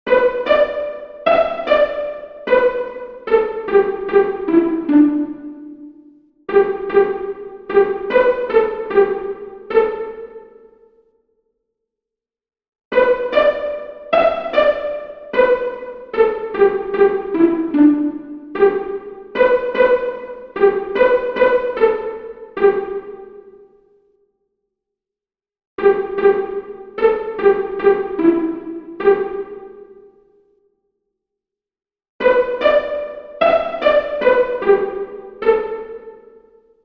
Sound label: 144bpm, mandolin